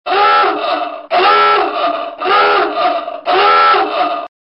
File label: Submarine Dive Horn